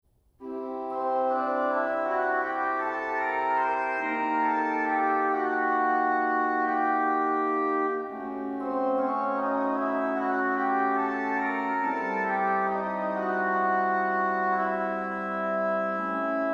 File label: cornet